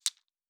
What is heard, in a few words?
Objects Small, Fantasy Interface Sounds